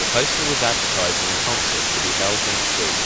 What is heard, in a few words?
noise